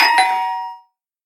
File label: doorbell single press